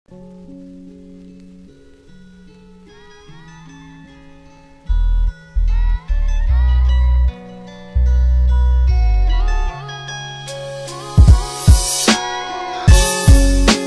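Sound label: rep minus instrumental beats grustniy minus, sad instrumental rap beat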